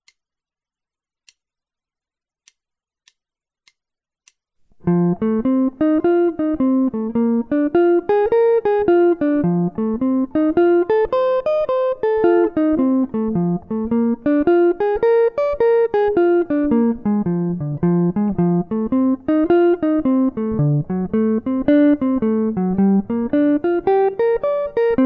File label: guitar jazz blues; lesson